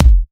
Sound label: Bassdrum